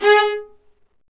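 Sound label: Marcato, Strings; Vlas Marcato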